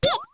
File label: Player Small Jump; HeavenStudioPlus; working Dough